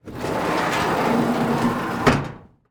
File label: Morgue Drawer Open; Medical; Blastwave